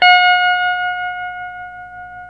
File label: TELE TREM G, Guitars, TREMO; Spectrasonics; Hans Zimmer Guitars VOL-1; TREMO-LUXURY